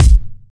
steel kick drum sound g key, Royality free kick drum single shot tuned to the G note.